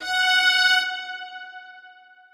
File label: Strings kiai